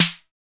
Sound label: SD 808; Best Service Gigapack 2